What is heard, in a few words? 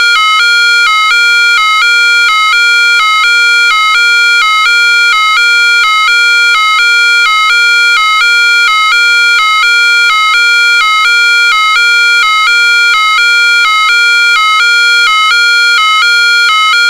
special warning